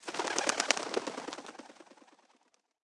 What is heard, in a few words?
Ambience Menu Pigeon Wings; Subway Surfers City; Android, iOS